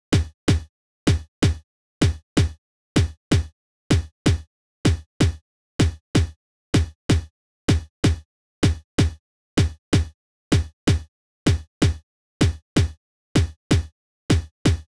Drum Loop 127 BPM Percussion, House; Loop Stems; Cymatics; GHOST (Beta Pack); All There Drum Loop

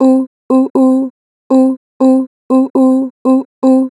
Ooo - 2 bar vibrato, Vocals, Natural Vibrato; Harmor Vocals

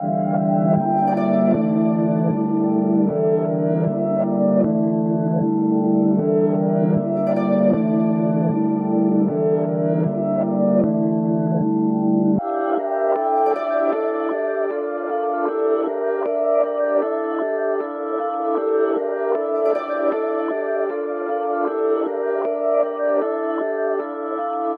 155 BPM D Min, Melody, Reverse; Cymatics; 2022 Melody Collection

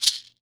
Maracas, World Percussion; Drum Samples; Cymatics; PHARAOH Beta Pack; Pharaoh Premium Drum Samples (BETA)

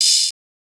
Open Hihat; Drum One Shots; Cymbals

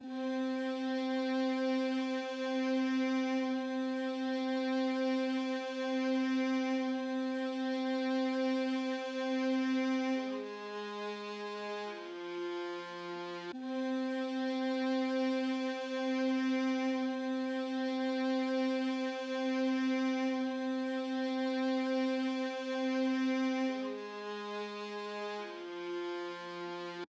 142 BPM F Min Strings, Drill; Loop Stems; Cymatics; Bastille

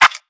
Percussion, Hip Hop, Drum One Shots

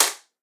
Dubstep Clap; Claps; Ghosthack; Dubstep Sound Arsenal

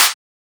Clap, Techno; Techno Sounds 2024

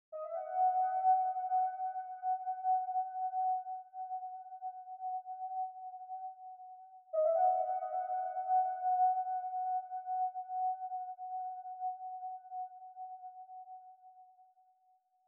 137 BPM G# Min Brass Low; Cymatics; Black Benz; 2022 Melody Collection Platinum Expansion

